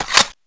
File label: clip out, weapons